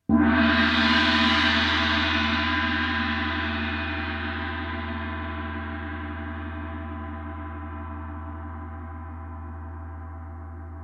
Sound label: Gong